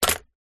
office scissors set down on table; zapsplat

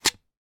household cd remove from case; sound effects one

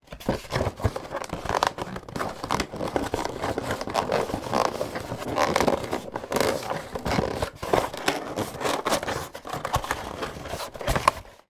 foley pizza box handling movement; zapsplat

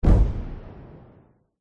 sound design thud hit dull fast tail; zapsplat

